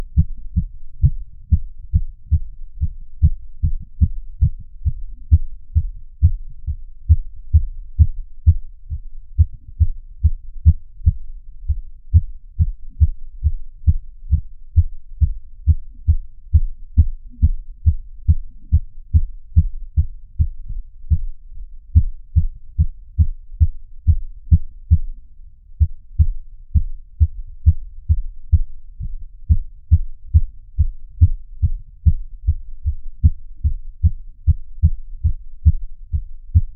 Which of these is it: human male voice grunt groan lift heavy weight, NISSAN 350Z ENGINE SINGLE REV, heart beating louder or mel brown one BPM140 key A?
heart beating louder